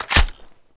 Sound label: ump45 clip in; weapons; usc